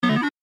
win; Hot Off The Press

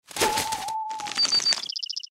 birds transition To Base; Bouncy Balls 2

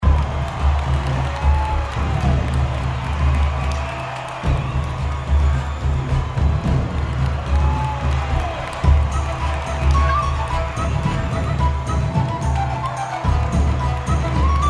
music caber Toss Bonus; Highland Reels

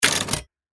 stop; Fluffy Arcade: Doubleup Ducks LuckyTap; Eyecon

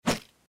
bonus caber throw; Highland Reels

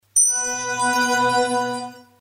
basic; Champions of Poseidon; smb

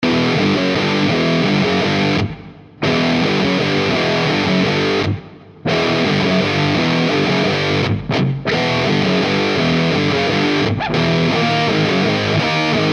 general Music layer; Champions of Valhalla

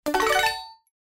bonus prize medium; Highland Reels

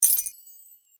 bonus prize coin spin size pop; Temple of Iris 2

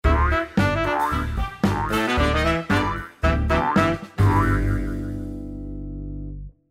Opening Sound; Bouncy Balls 2

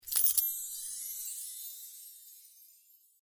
bonus prize coin spin coin appear; Temple of Iris 2

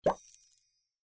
symbol pop; Fluffy Favourites Megaways

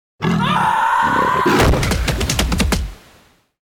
outro jaguar sfx; without music

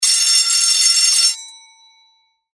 bell fast; Step Back 7's

